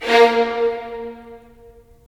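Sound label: strings violins; Roland - LA Composer vol. 4; Violins Sh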